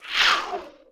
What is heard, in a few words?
Arrow Pass; SFX Kit; Foley - Misc